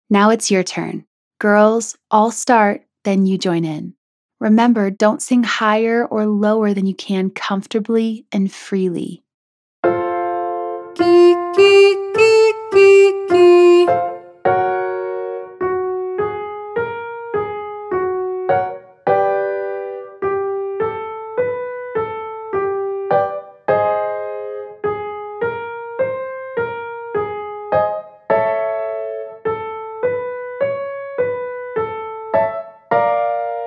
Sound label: Gee 3 Note F